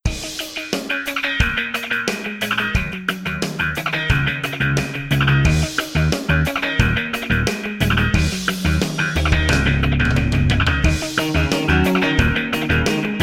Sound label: zombie rock; nerf-ultimate-party